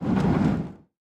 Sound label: wooden gate slide open